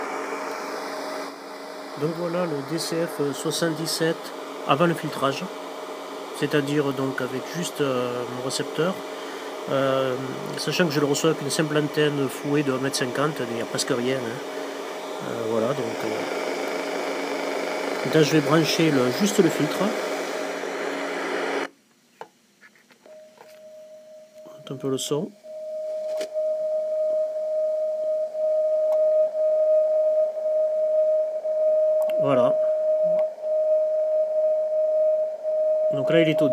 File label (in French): Dcf77 filtre